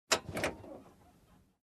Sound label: Car interior compartment click